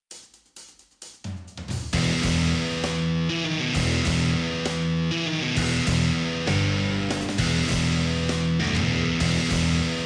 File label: Event Enemy